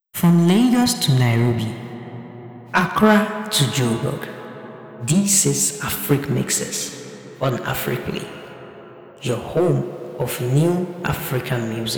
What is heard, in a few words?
Jingle